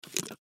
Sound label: Flashlight Battery Slide Out; Camping Gear Equipment Tool; Flashlights; Blastwave; Foley